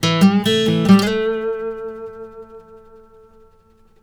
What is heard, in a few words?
BARI LICK, Blues Guitar